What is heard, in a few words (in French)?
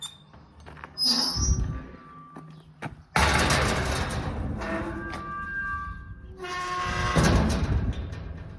nouveau bruit portail entree